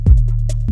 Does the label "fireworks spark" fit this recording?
No